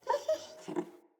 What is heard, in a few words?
Wolf Cub Trapped Call Close; Animals; Wolf Cubs; Orengal Wolf Cub A